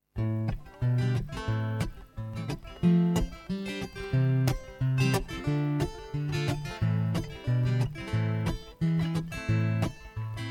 Guitarist

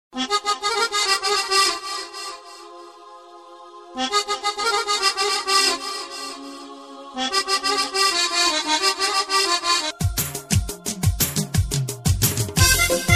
Akordion

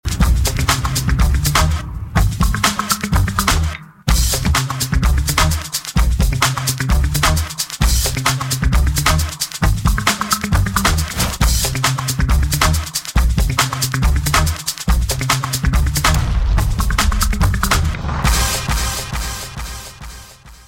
Drum and bass

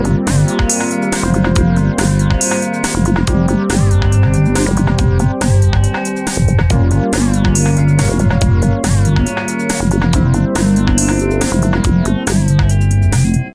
Looooooooop

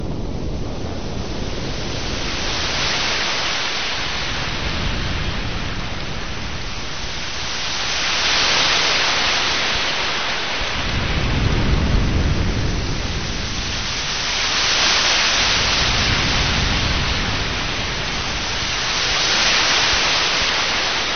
Sea Noise

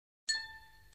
Single Click

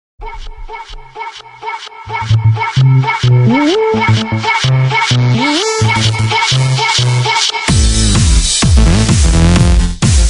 Electro

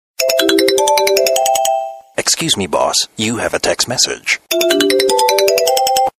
Text message

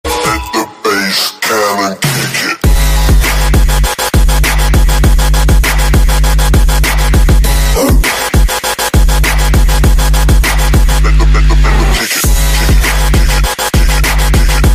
Bass

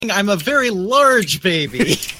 Large baby

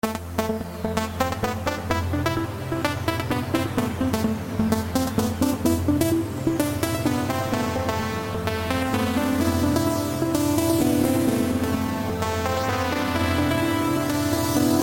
Perfect Melody

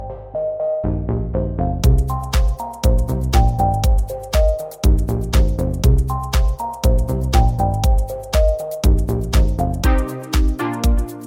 Jazzy

